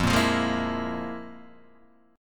F Minor Major 7th Double Flat 5th, Guitar, DADGAD

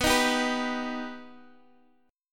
B Augmented B Guitar, chord; strummed; 19,18,17,16,x,x

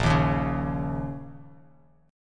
A sharp Minor Double Flat 5th, Bass Standard; strummed; 6,6,1,6; JGuitar

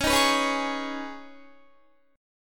Db 7th Suspended 2nd Db, down, Guitar

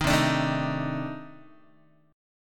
C Minor 6th Add 9th C Guitar; Cm6add9 strummed; JGuitar

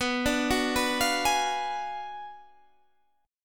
B Minor 6th Sharp 11th B

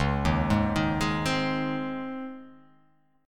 C sharp Major 7th Suspended 2nd

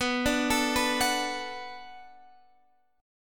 D 6th B, chord

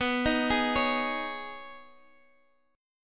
B 6th Add 9th B, Guitar, Drop D

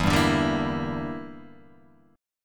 E Minor 7th Flat 5th E

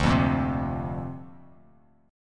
D-Minor 7th Sharp 5th D Bass Standard, chord; Dm7#5; strummed

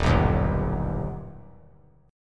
Eb Augmented Eb; strummed; JGuitar

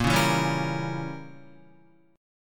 A sharp Diminished 7th, Guitar; strummed; guitar chord

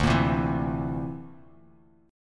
D 7th Suspended 2nd Sharp 5th D, Bass Standard; D7sus2#5 strummed; JGuitar

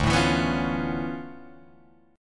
D Minor Major 13th, down, Guitar, DADGAD; DmM13; strummed

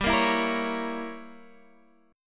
G Diminished 7th

